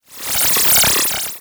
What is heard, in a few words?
Title Transition Digital Readout; Production Element Title Transition